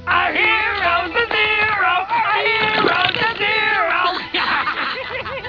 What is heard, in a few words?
pain